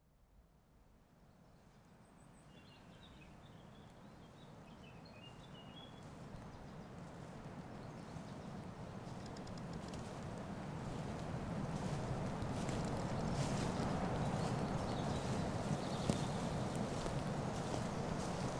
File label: African Trance, Autumn Walk, Binaur; Trommelkreis; MyNoise; Aural Scan